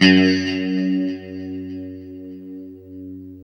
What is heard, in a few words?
LO-E F#2, Guitars; Spectrasonics; Hans Zimmer Guitars VOL-1; JC-SHEEN